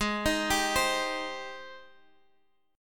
G sharp Major 7th, Guitar, Open F; arpeggiated; x,x,8,10,10,7; G#M7

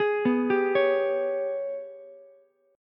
G sharp Minor Major 11th G sharp, Ukulele C6; arpeggiated; JGuitar

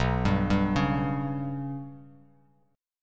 B Suspended 2nd Sharp 5th B down, Bass Standard; Bsus2#5 arpeggiated; JGuitar

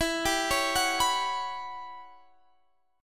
E Minor 6th Add 9th guitar chord, Standard; arpeggiated

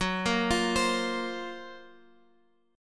F sharp Major Sharp 11th F sharp, Guitar Open F; x,x,6,5,8,7; arpeggiated

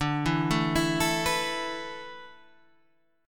E Suspended 4th D, Esus4/d chord; arpeggiated; JGuitar

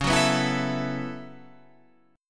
B 7th Suspended 2nd Suspended 4th, chord

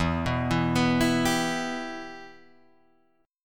E Minor E down Guitar Drop D

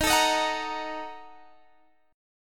Bb Major 7th Suspended 4th Sharp 5th, Eb, chord

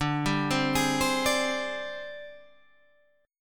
G sharp Major 7th Flat 5th

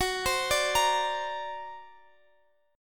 D Augmented 7th, chord

